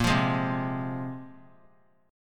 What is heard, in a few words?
Bb 7th Suspended 2nd Bb, 3C 3F 3A 3D; Bb7sus2; strummed; 22,24,23,22; JGuitar